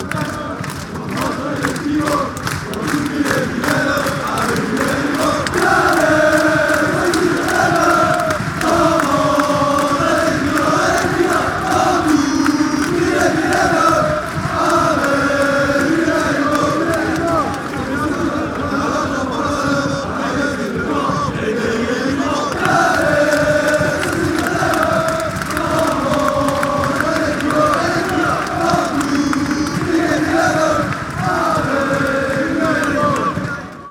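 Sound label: cheer song, football songs and soccer chants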